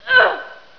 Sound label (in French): Cri Fille